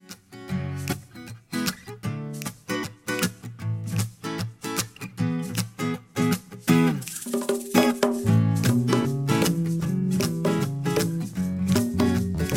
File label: acoustic